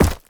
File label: rock strike; Rust